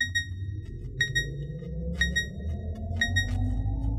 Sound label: beeps and wush; Drum loops